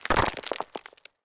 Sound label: debris; Smite; Cabrakan